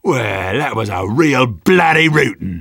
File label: jeers